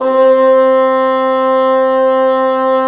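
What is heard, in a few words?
wind bassoon; LA Composer vol. 3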